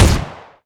pistol fire lfe; weapons; Half Life Alyx

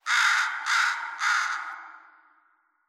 amb crow caw

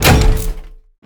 turret takes damage; Counter Strike Model Pack; CS:GO; survival

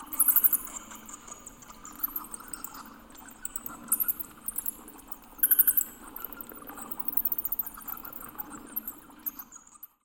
amb animals bat squeak

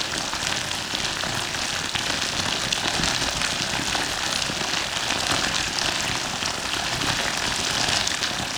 paint nozzle waterfall lp; Portal 2

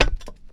metal solid, footsteps; player

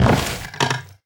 fs fm plywood, footsteps; player; Portal 2

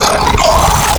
vox abandon gurgle, zombie

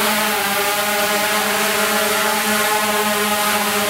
drone loop, vehicles; Counter Strike Model Pack; CS:GO

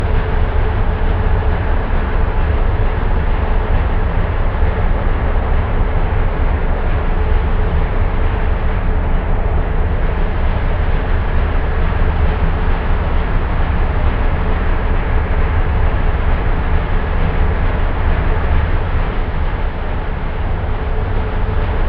interior; ambient; Counter Strike Model Pack; CS:GO; nuke

